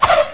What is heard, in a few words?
Arrow